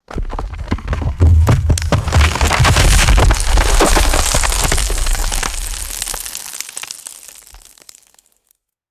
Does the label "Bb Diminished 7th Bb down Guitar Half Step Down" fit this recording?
No